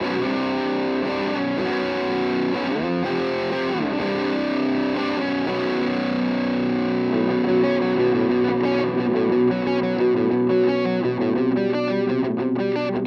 MH 1000 Zerre; ESP LTD Deluxe

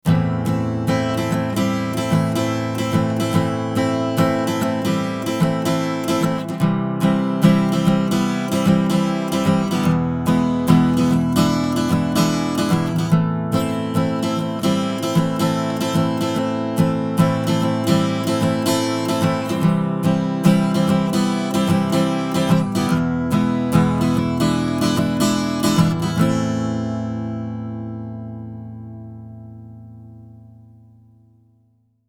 Chandler Limited TG Microphone Cassette Western Gitarre Soft Compression

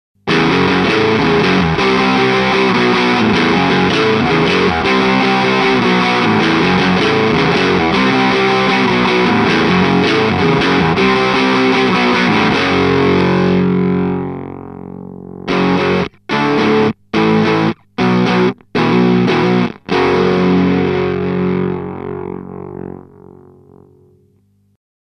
Korg Nu Tekt HD-S Harmonic, Am Ende reduziertes Gitarrenvolume

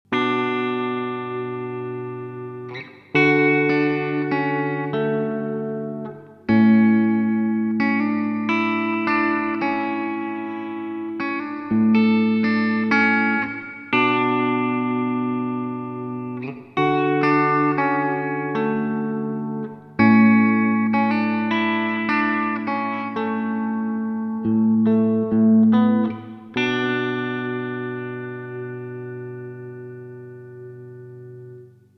Earthquaker Devices Ledges Hall Alle Regler auf 12 Uhr

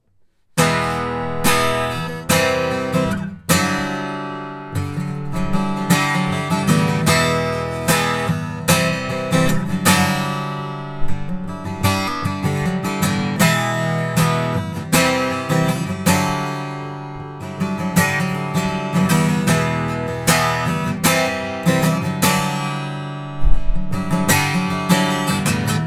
Akustikgitarre Manuell Onboard Mics; Tascam Portacapture X6

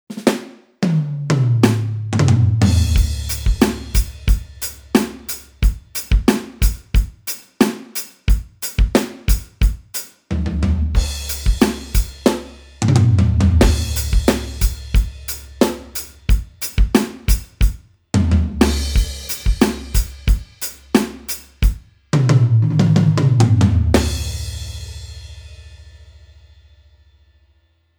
Stil Reggae

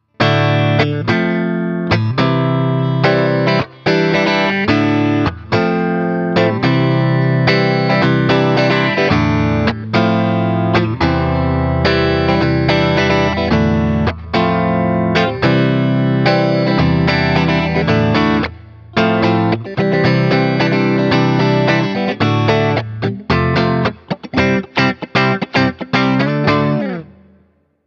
Beide Singlecoils

